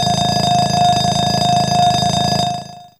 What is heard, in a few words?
Alarm C3, Fairlight CMI IIe Bells